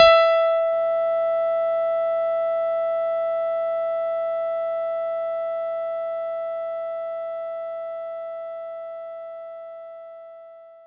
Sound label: CP 80 EP E5